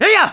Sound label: Whoop; Link whooping Epona